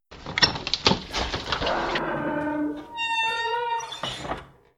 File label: unlock